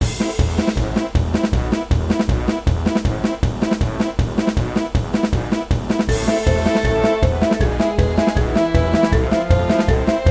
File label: grass battle middle boss normal; world grass